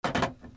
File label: PHONES